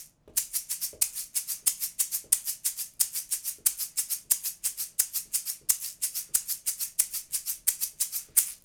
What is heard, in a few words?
shaker